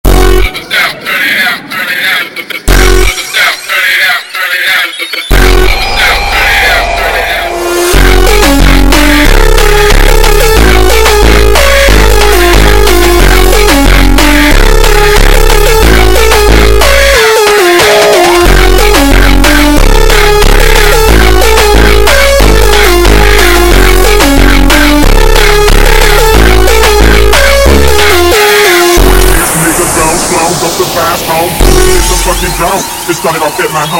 Montagem ultra Slowed

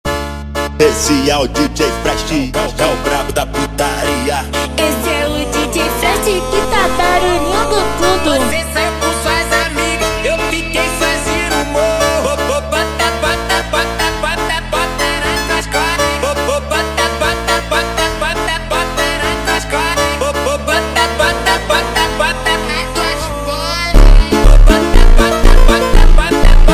Montagem slowed

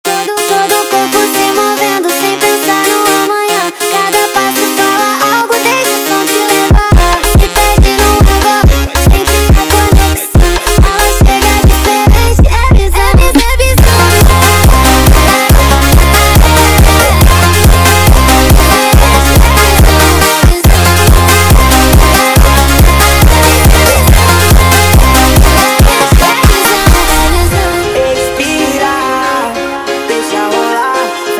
Funk sped Up

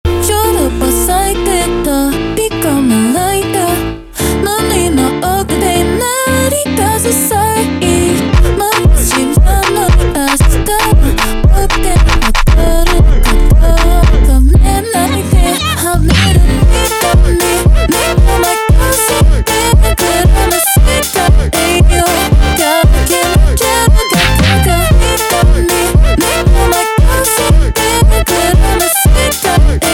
Montagem slowed

